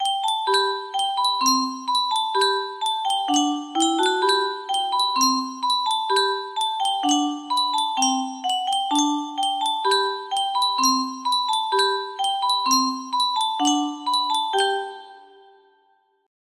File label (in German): Yunsheng Spieluhr